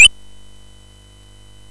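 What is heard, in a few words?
Mountain King, MOS 6581 SID chip music, games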